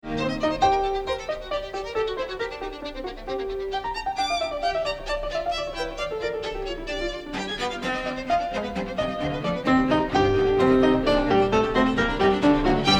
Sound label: quintet; schumann